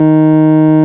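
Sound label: organ D#3, Farfisa Combo; Keyboards of The 60's and 70's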